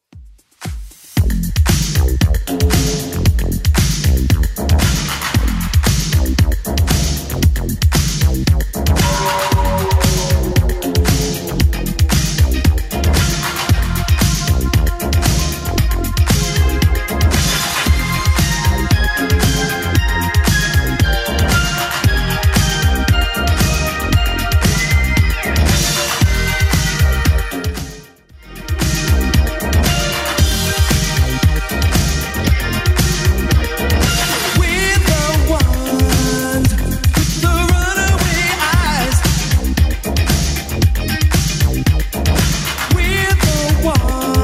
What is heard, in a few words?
80s Club 115 bpm; 80s Club Edit